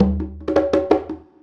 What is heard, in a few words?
Conga Fill; Essential Percussion